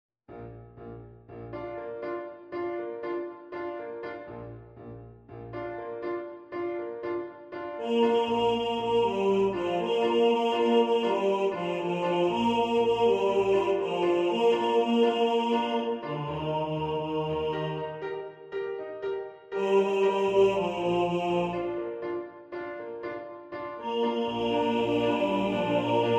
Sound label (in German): Stimmen gleich gewichtet, Männerchor; zum Üben